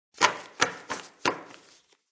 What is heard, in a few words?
Bng Indx Crds, Banging a pile of index cards on a desk.; Bangs; Objects